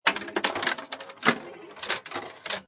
Pinball Sound Long Sequence Of Ball Movement And Clanks; Video Game Sound Effects